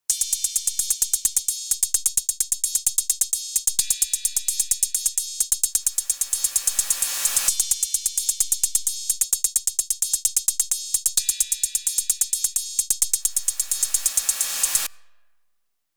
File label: cymbols loop